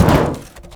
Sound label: Solid Metal, Physics Objects